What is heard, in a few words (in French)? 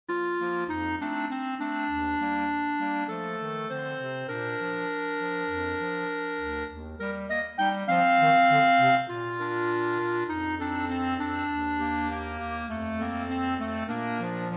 sous le soleil quatuor clarinettes